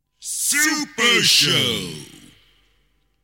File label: Jingle